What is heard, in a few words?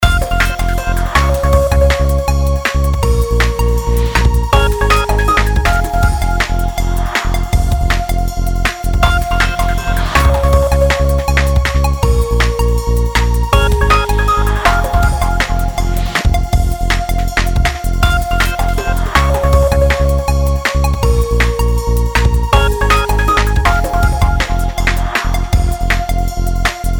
News Show Loop